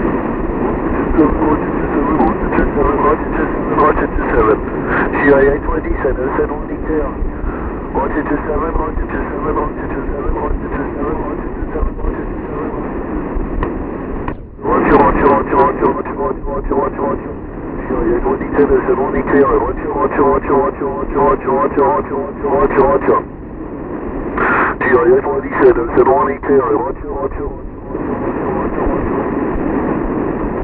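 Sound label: 2M Meteor Scatter, AMATEUR VHF/UHF; OZ1EKI; 2M Meteor Scatter (MS)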